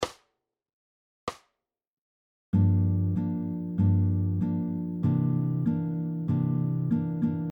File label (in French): rythmique bossa; bossa nova